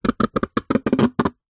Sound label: foley plastic empty tub scratch sharp object; zapsplat